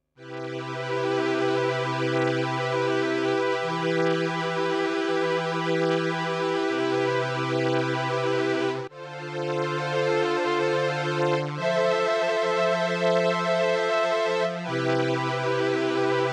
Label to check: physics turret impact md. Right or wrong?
Wrong